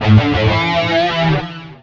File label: Pickup Guitar Riff; SFX Kit; Pickups